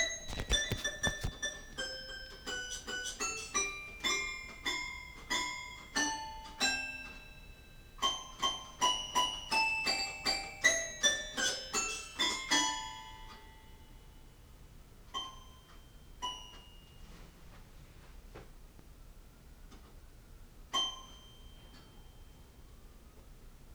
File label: piano